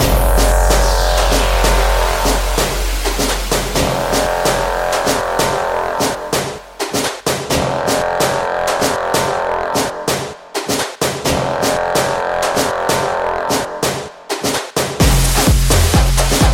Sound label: Full Loop F